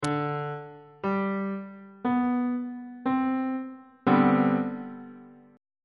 b sharp major 7th suspended 2nd 1st inversion on bass clef